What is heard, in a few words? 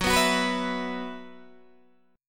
F sharp 7th Suspended 4th, Guitar, DADGAD